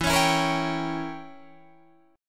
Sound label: E Diminished 7th E, Guitar Drop D